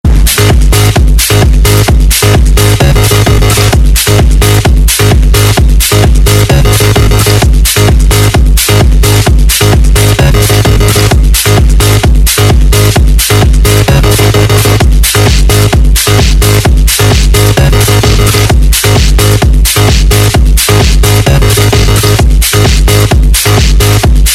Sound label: Electro Positive